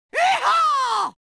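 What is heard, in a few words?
Wild West sounds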